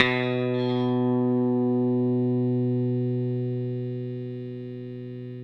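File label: electric guitar sus B3 right; Sampleheads; Whole Lotta Country 1 & 2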